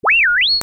SCIFI

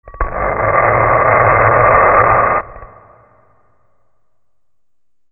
FRICTION

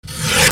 OFFICE

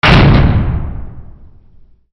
DOORS

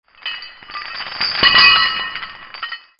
FALLING

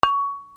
BELLS

